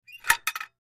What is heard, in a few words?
Metal Hinge Lever Squeak; Metal Doors; Foley